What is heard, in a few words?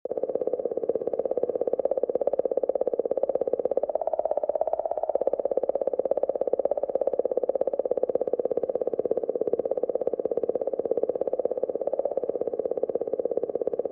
A Singing Comet